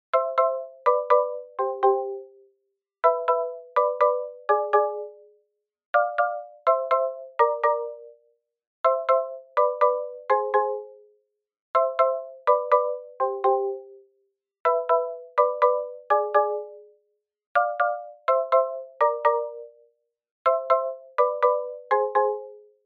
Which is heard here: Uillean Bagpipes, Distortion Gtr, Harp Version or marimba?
marimba